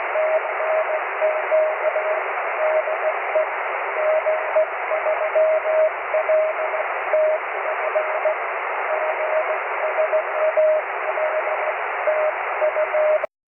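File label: cw, 23 cm EME; g3ltf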